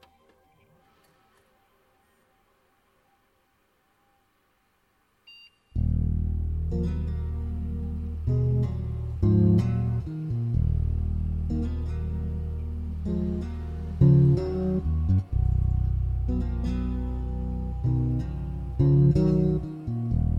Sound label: Strings; lolicore